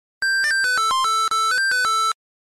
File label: Porky text alert